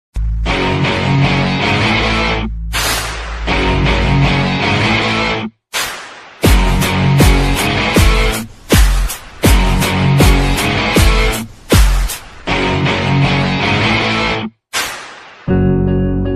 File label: BGM Ringtone Movie BGM Ringtone; arjun reddy